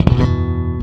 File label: Slap One Shot EB, Bass; Bass X